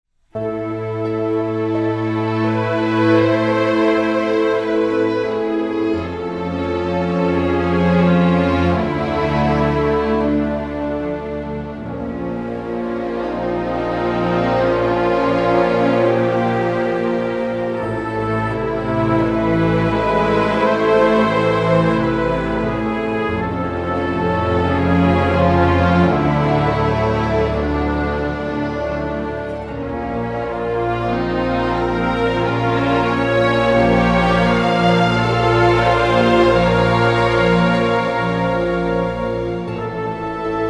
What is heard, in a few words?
Siren Song; Tiragarde Sound